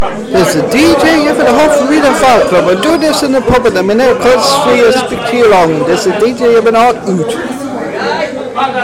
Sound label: pub